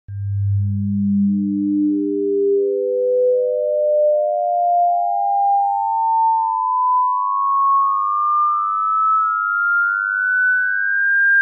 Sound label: Harmonic Series Synth; natural harmonics fretless fretboard; ragajunglism